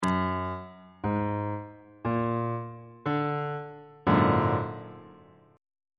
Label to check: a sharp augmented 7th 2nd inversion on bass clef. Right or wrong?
Right